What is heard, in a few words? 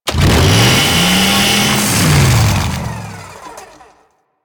Saw Ride Start; Weapons; Melee; Warframe; Grn Ghoul Saw Player